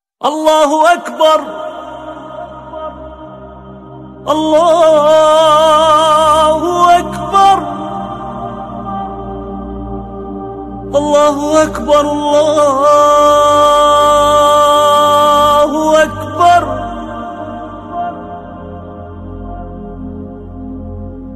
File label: Sweet Azan